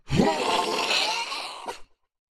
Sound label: Thor Death Vocals